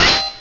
Acid Armor; pokerogue; battle anims

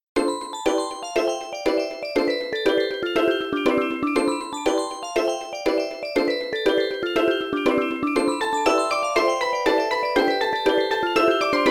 fairy cave; pokerogue; bgm

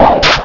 Fire Lash; pokerogue; battle anims

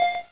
bounce; pokerogue

